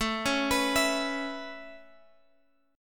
A Major Add 9th A Guitar Open G, chord

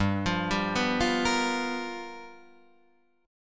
G 7th Sharp 9th G chord, Guitar Open D

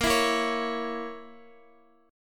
A sharp Diminished Guitar, chord; A#dim; strummed; x,x,10,9,10,9

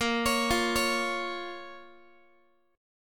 Bb Minor, Guitar; arpeggiated; JGuitar

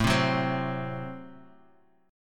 A Minor 6th A Guitar; strummed

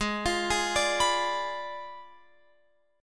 Ab Diminished 7th Ab, Guitar, Open F; Abdim7; arpeggiated; x,15,17,15,17,18

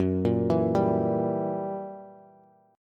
Gb 7th Flat 9th Gb Bass; arpeggiated; JGuitar

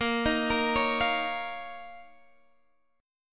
D sharp 7th Suspended 2nd A sharp, Guitar

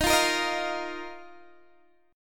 Eb Suspended 2nd, Guitar DADGAD

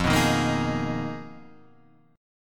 Ab Minor 6th Add 9th, F, guitar chord

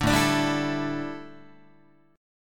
A 7th Suspended 4th down Guitar, chord; A7sus4 strummed

